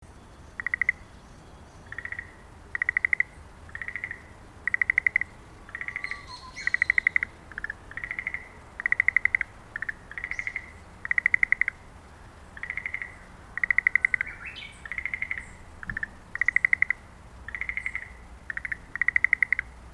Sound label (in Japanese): aogaeru